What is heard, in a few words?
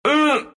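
pain, Russian; dota2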